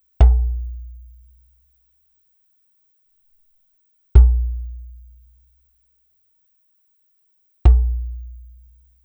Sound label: Retro Tube con Pad